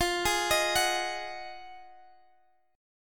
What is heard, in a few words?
F Minor 7th Flat 9th